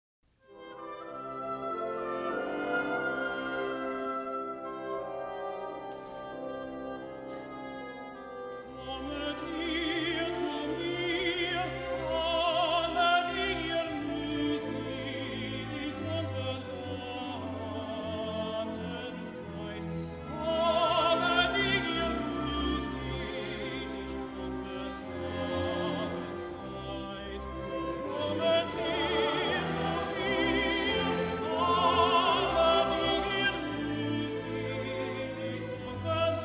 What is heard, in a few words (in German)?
Tenor Chor Kommet her zu mir; Erntefeier